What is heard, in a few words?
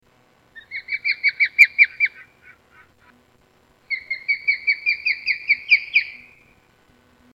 bald chirping, eagles